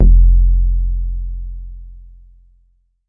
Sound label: synthy round, Jungle Bass